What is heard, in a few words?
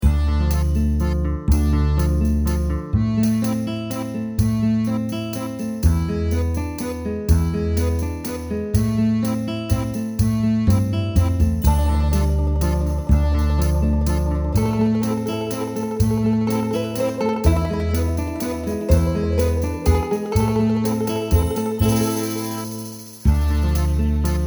Gypsy Waltz